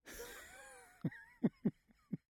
laughter, male, 60s, heeing, restrained, chuckle, mouth clicks; Eiravaein Works